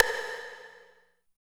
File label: V. STICK; East West; Phat & Phunky